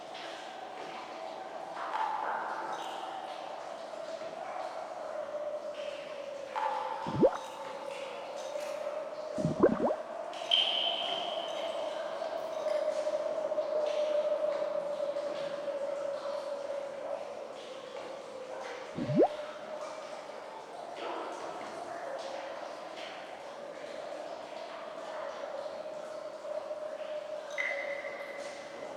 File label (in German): atmo hoehle; Fire: Ungh's Quest